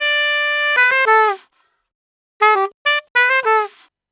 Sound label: Sax 100 bpm G, Saxaphone; Quazibeat; African Secret